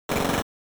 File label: jackhammer